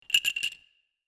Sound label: button